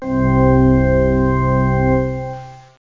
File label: cathedral organ, synths; Zoom - Release 2 (1996); Active Software